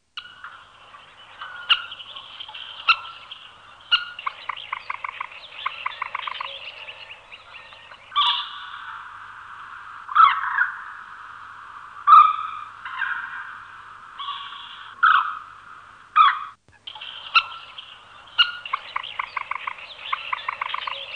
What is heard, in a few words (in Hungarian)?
Vнzityъk; Madarak